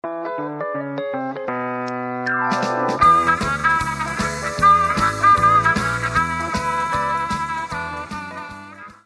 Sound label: piano